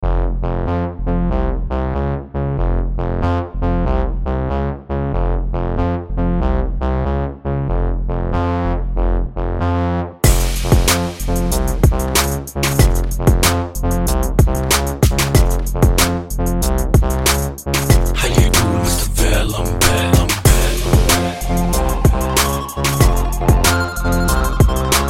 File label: Retro Style Beatz